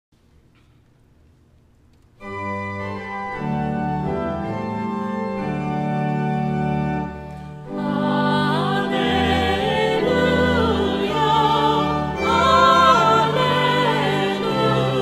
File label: CELTIC ALLELUIA GROUP